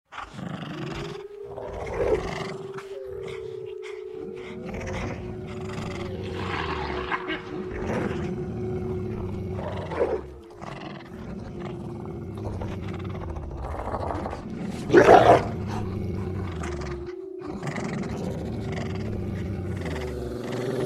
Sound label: AMB Misty Lands; MACenter